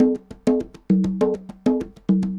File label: conga 100 bpm, latin; Sound Effect Library